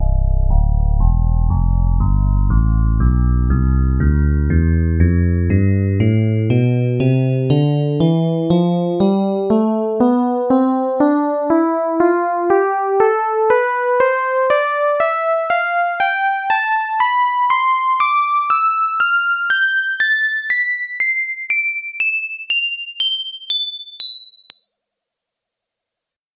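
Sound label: electric piano tonleiter; legend ep 2; SpectMorph Evaluation #4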